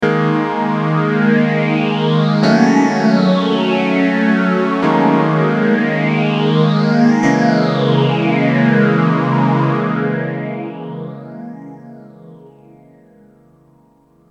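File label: Yamaha phaser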